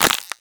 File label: plastic bottle; oneshot; crushables; Half Life Alyx